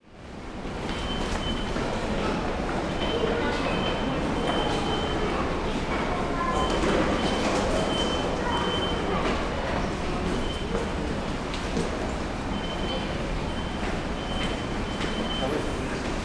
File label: train station wickets